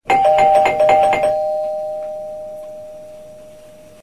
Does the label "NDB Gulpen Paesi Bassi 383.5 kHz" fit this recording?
No